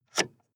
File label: sports car int ventilation adjust; verkehr transport; autos